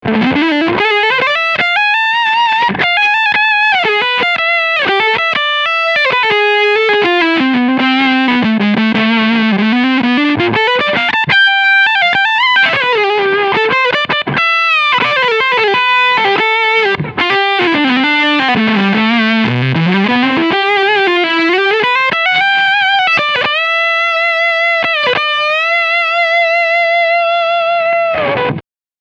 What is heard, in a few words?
Full Gain Low Fat Mode